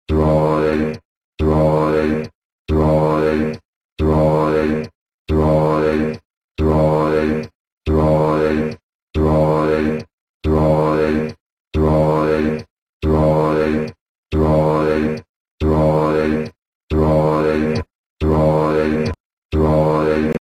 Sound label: DROID RINGTONE